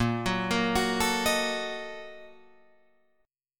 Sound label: A sharp Major 7th Suspended 4th Sharp 5th, Guitar, Open F; arpeggiated